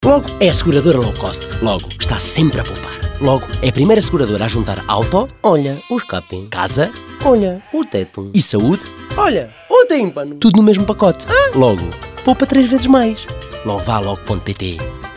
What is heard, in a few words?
timpano; logo